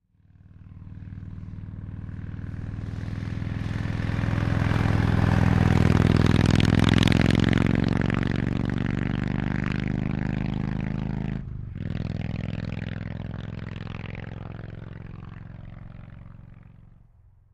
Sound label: Harley Motorcycle, ext bys; harley-davidson ext bys